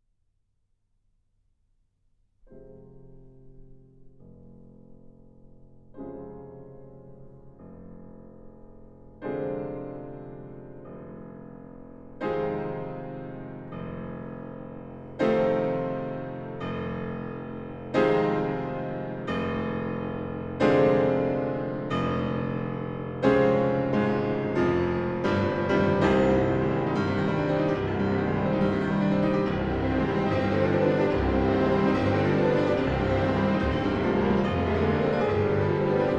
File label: Vtoroj koncert dlja fortepiano s orkestrom; Sergej Rahmaninov